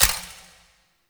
Countdown Tick; UI; Undercroft; Warframe; Duviri

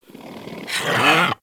Puppy Idle Proud; Vocals; Warframe; Kubrow

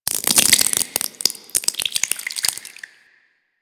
Infested Ancient Taunt Flesh; Enemies; Warframe

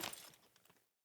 Grunt Foley Movement Armour; Enemy; Sinecure; Melee Grunt

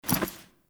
Foley Idle Short; Ambience; Gameplay; Teshin; Duviri

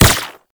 gunbrella bullet deflect; Weapons; Warframe; Tenno; Koumei

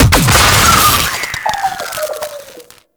Sentient Search Drone Explosion; Warframe; Tau

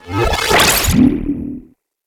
UI Mods Fuse Card

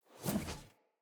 Sphere Polearm Whoosh Small; Weapons; Player

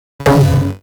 Rifle Projectile Pre Explosion; Weapons; Warframe; Corpus; Ruban

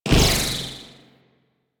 Plasmor Ricochet, Shotgun; Warframe; Weapons; Corpus; Arca Plasmor

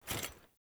Foley Action Armour Jump Land; Player; Deora

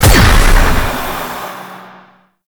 Stun Burst; Warframe; Stalker

